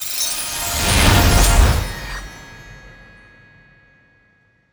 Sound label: Reward